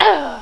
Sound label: fall; quake2; chastitymarks